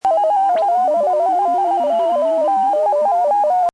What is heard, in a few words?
mfsk, digital modes